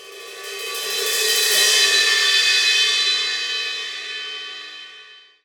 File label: Hi Swell